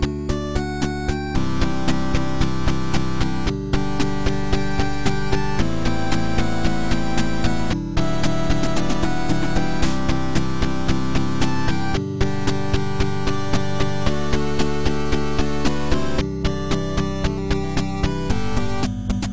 8 bit

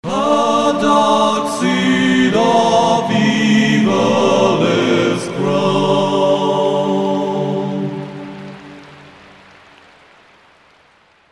Elf Music; Lotr

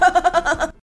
Is Calling Pop

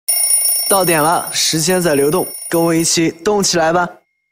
Alarm

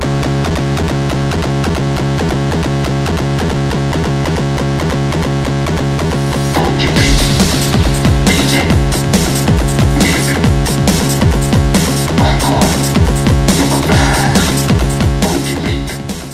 trance music, ringtones

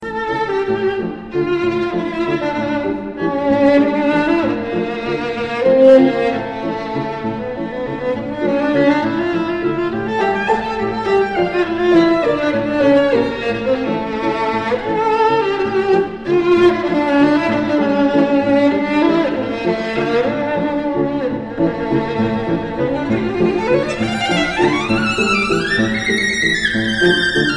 Best Piano Violin

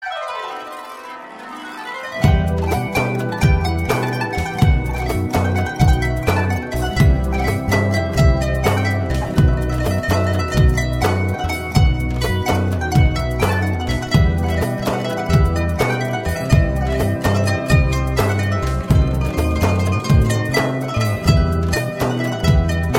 iraqi kurdi; alternative

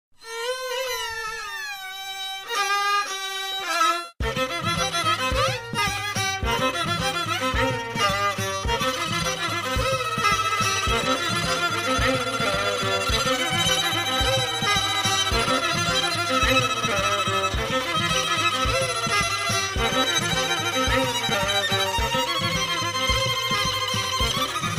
violin solo

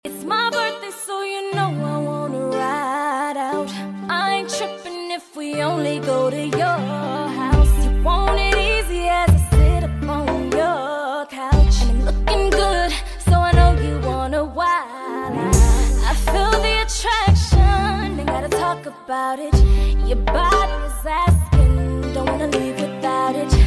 birthday remix; hip hop

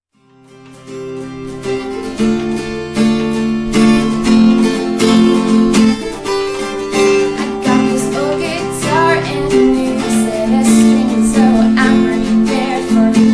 i ve got this old guitar and a new set of strings

